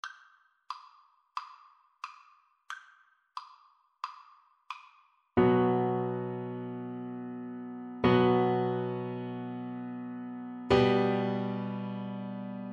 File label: hava nagila Double Bass; Slow Playalong